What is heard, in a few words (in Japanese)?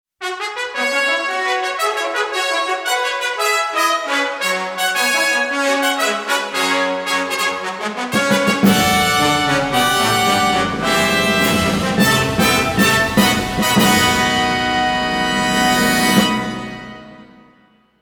チャレンジレース ファンファーレ; umamusume pretty derby winning live 12 2023